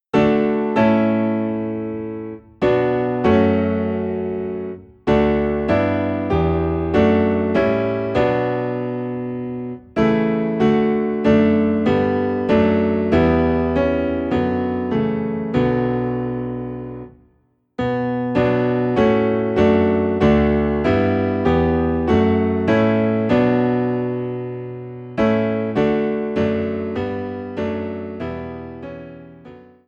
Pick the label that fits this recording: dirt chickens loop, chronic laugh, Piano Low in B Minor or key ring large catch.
Piano Low in B Minor